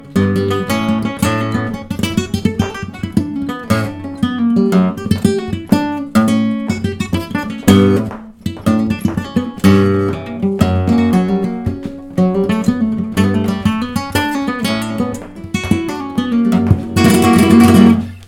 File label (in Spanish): falseta